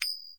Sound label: coin Value; mega joker